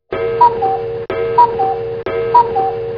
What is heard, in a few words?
cuckoo 8day, mech cuckoo clock